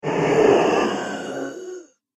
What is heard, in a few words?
Ghostly Halloween Monster